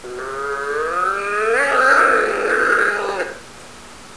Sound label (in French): Blaireau Grondement